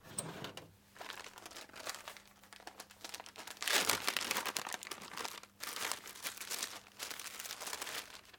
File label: Plastic Wrap Crinkle